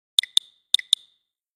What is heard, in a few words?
Message; Nokia; 6290